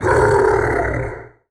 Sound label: Kraken Death